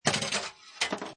Pinball Sound Ball Launched Rolls And Comes To Stop; Video Games